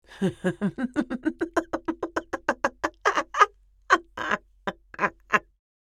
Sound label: Hysterical elderly woman laugh